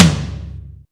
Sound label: TOM DRY; Back In Time Records; Big Bang